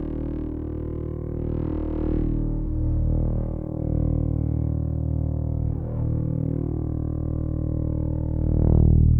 Bass FX

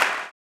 CLAP, blues & soul; ILIO; Vocal Planet

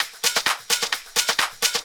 drum 130, techno trance drumloops

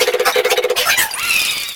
Fan Chant; Mario Paint

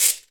PRC RATCHE, Orchestral Perc; Roland LCDP03 Orchestral Perc; Orch Combo 1

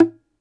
DOMRA, Plucked Strings; Segundo Domra; E-MU Producer Series Vol. 7 – Old World Instruments

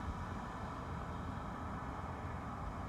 Ambience Loop, outdoor

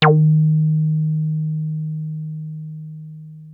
303 D#3, TB 303

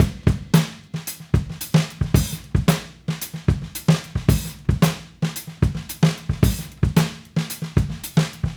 Raw Funk Drums, 70s Analog Funk; Sonic Foundry (Sony Creative Software); What it Is! 70s Analog Funk

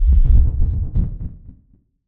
Low end rumble; musicradar

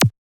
Yamaha DJX 2B, Hi-Q

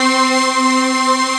ORG C, organ; Best Service ProSamples vol.10; House; AKAI

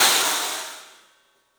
CYFX, EFFECTCYMB; 3D Sound; 3D Drums

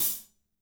HAT 3-L, DRY KIT; Spectrasonics - Burning Grooves CD2

